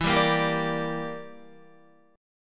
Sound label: E Minor 7th Flat 5th E, Guitar; Listen to Em7b5 strummed